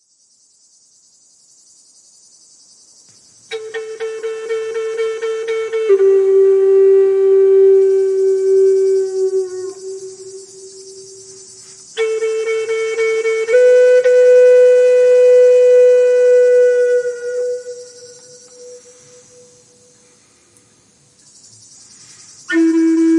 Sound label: Cicada